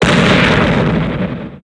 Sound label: bomb; AirCraft; Game Demo